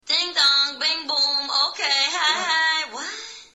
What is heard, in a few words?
bing boom wha, doorbells